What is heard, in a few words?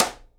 SIDE STICK, Live Snares